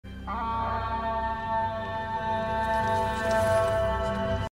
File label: HORN SOUND; ELF; LOTR